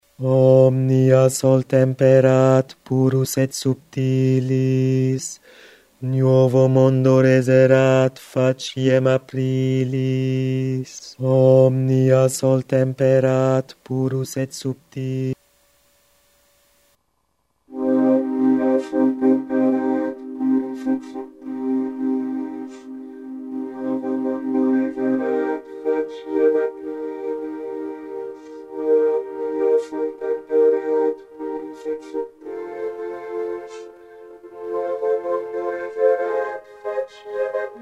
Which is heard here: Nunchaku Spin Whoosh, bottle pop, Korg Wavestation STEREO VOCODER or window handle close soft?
Korg Wavestation STEREO VOCODER